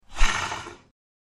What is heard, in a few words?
Slow Trackball Spins, mouse